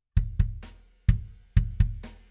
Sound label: BD In